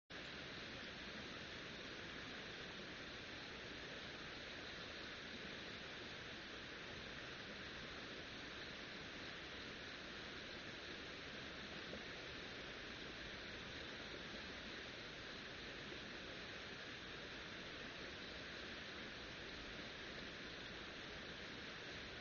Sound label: riverside; Kyoto U Ashiu riverside